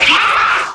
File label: pain